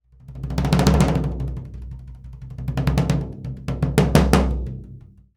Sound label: AMB TOMB, AMB PERCS; Univers Sons; Basicussions